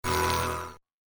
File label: Laser Nose Trimmer; science fiction sound effect; Laser Sound Sound Effects; star wars / trek style games; Andrew Fleming